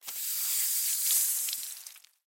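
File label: spider sound; pauk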